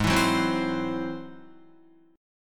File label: Ab Augmented 7th Ab down Guitar; strummed; Ab+7 chord; JGuitar